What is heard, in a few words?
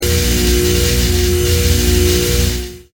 electric hum